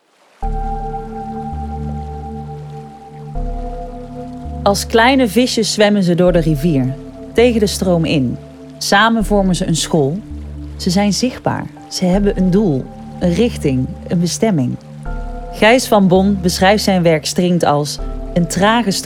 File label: stringed